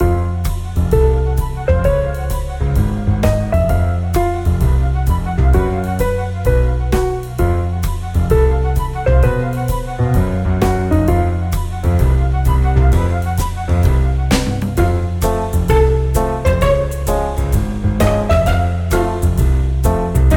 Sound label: loop; A Hat in Time